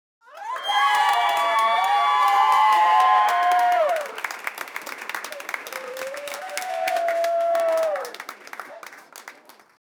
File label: the large audience and cl, cheering